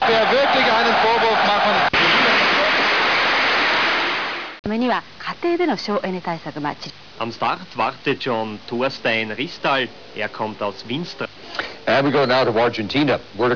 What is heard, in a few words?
zap; packard bel